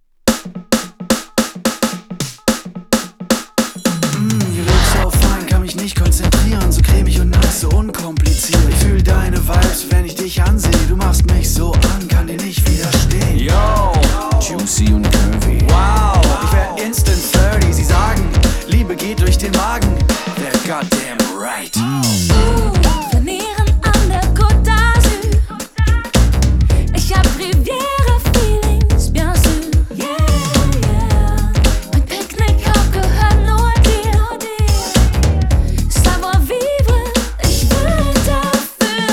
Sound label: New Funk